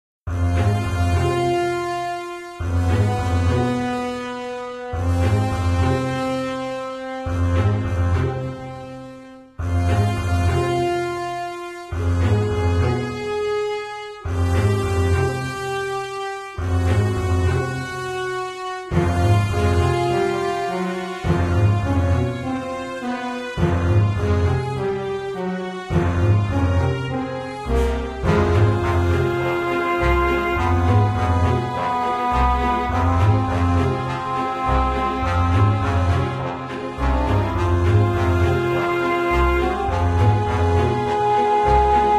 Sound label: Tension